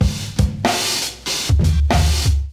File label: Groove 95bpm, Drums; MIDI Clips; High Rise; Ableton; Factory Packs; Chop and Swing